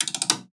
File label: percussion oneshot mirror; Pop; Drums; SD PASADENA Pop Sample Pack; Slate